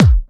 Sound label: Bassdrums UK Trance Kicks; Vengeance Samples